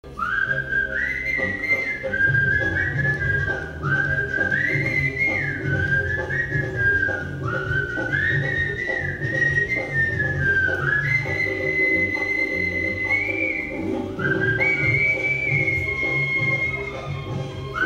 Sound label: whistle